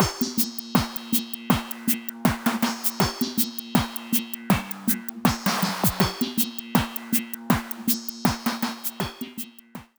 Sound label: adding swing method 1